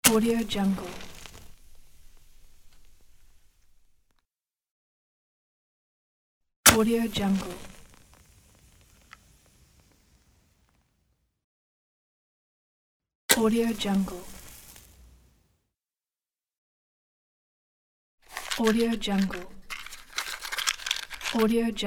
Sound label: matchbox lighting and ignition pack